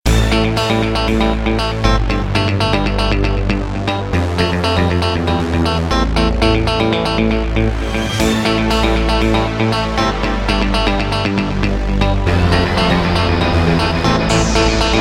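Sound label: Old School Breakdance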